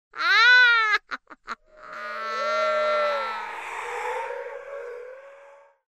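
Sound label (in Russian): witch wraps herself in smoke and flies away; Для Хэллоуина; Коварный злой смех старой ведьмы, забавный смех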